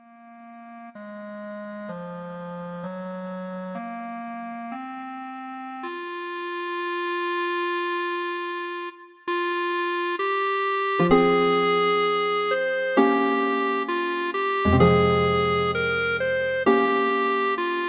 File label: Solitude Clarinet